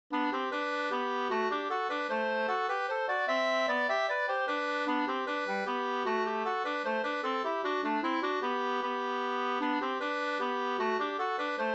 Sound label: mazurka; Morbrors mazurka Bh 2st